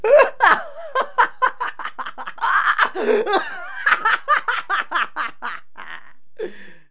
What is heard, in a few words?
laugh